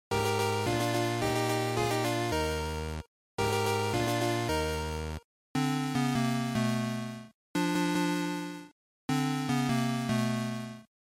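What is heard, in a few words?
Game Boy Action, bgm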